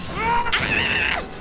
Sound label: Scream again; IRyScream